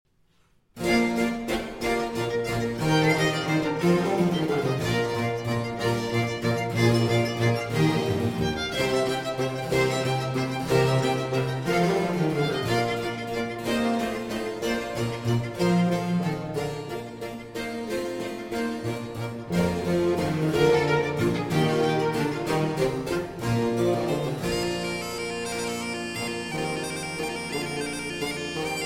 Concerto For Musette, 2 Violins and Basso Continuo in C Major; III. Allegro